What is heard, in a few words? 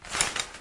curtain; gallery game